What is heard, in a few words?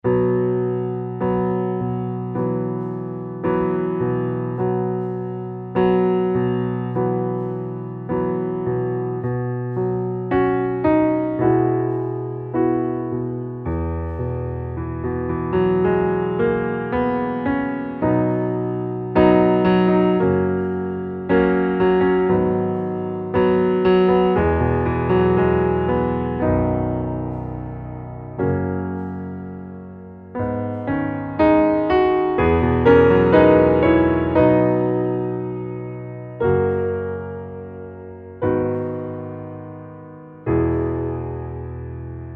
Underscore PIANO; Worship Flow